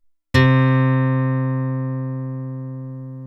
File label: guitar